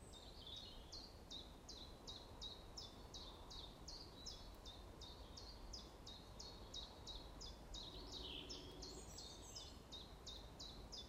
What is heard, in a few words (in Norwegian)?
Gransanger